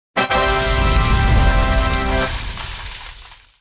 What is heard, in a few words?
tip sound large